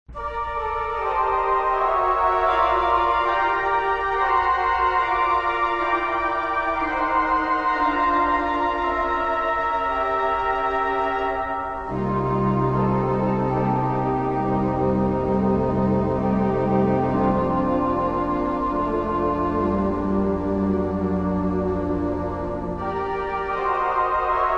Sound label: Cathedral Organ And Chimes